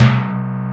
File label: TMP B2.8EQ, Ensoniq ZR-76